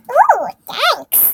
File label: "oooooooooo"